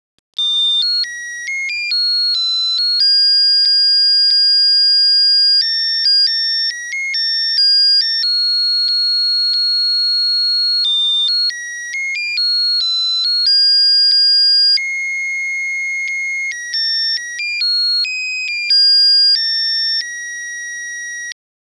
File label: Melody song